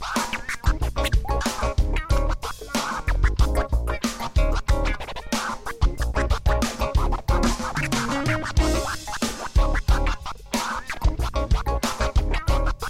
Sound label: scratch loop